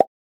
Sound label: ball drop